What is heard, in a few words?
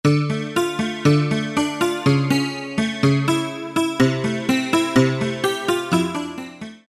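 Double Acoustic Guitar, Roland U-110; Factory Patch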